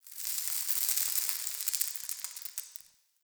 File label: Cave Dirt Trickle